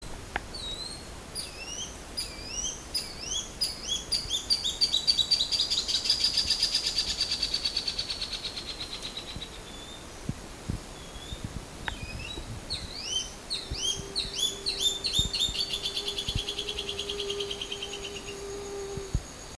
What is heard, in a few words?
Malacoptila striata